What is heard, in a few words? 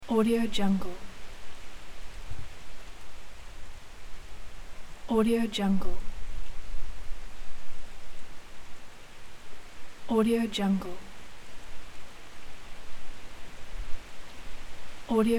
strong rain thunder rural